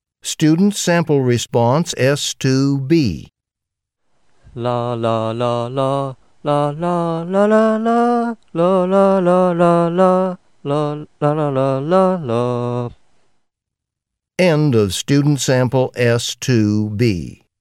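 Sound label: music ss2 sample, sightsinging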